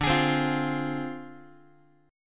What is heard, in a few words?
D Minor 7th Flat 5th Guitar; Dm7b5; strummed